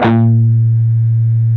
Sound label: PRS PWR B2C, Guitars; PRS PWR CUTS